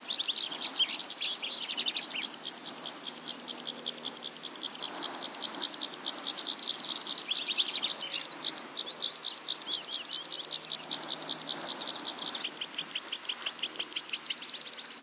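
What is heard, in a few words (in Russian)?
razvernutoe penie na prisade, shchevritsa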